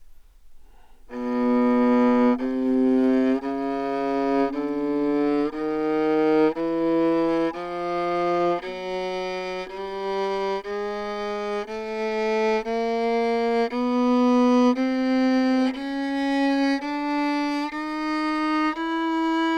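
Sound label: baroque viola chromatic; OPENAIR; Anechoic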